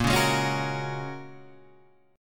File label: Bb Minor 7th Sharp 5th, Guitar, Open G